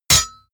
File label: sword hit metal